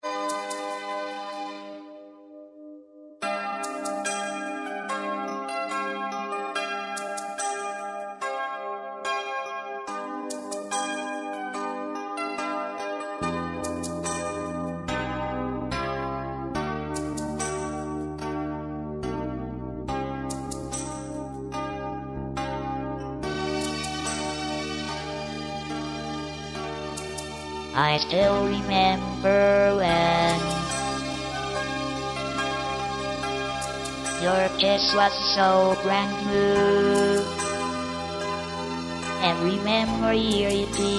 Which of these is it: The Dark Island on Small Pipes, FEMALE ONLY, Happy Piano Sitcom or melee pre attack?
FEMALE ONLY